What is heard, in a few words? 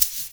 SHAKER; InVision Interactive; Percussion Slam